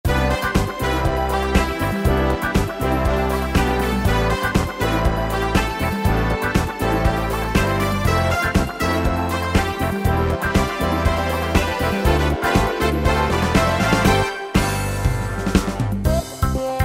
70's TV Theme, Yamaha PSR-3000 Factory Styles Movie & Show